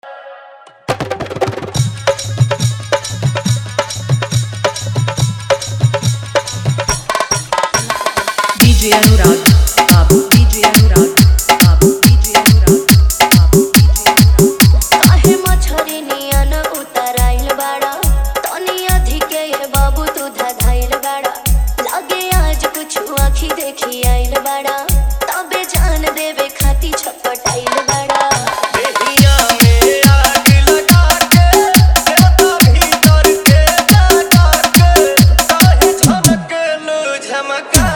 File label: Hard Bass Mix